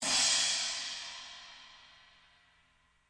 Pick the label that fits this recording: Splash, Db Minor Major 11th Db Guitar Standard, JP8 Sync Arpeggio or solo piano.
Splash